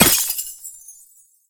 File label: ice spell impact shatter